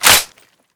boltrelease, weapons; psg1

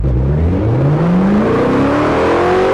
first, vehicles bugatti

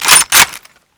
g3sg1 slide, psg1

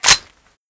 slide, weapons; svt40

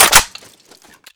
psg clip out

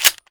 pdr bolt release, weapons

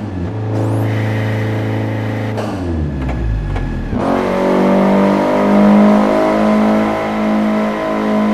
no shift, vehicles scirocco

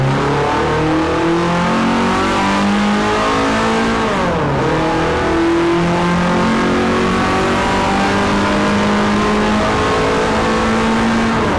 second, vehicles mx5

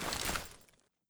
famas forearm, weapons

